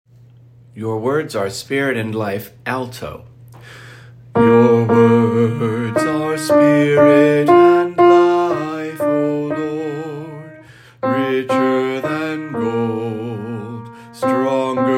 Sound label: Alto, choir music; St. Anthony of Padua; Choir Sunday